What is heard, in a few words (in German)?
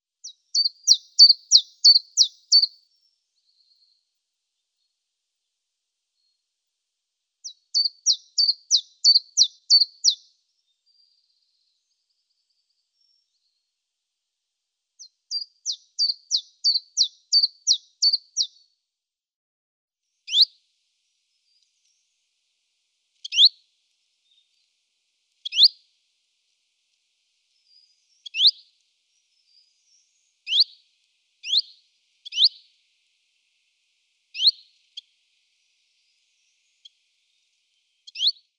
Zilpzalp Phylloscopus collybita